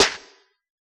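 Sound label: house snare; Giga Samples Collection; Dr 202 Kit 1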